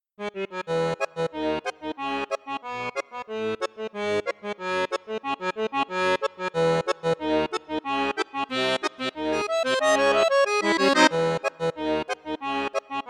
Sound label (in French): registre basson1 flute juste, accordeon; cavagnolo vedette 10